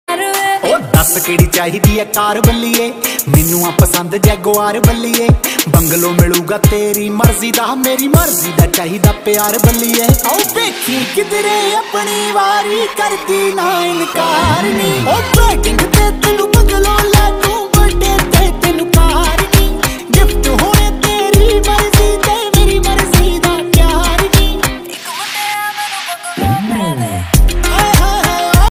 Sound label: Punjabi Beats Ringtone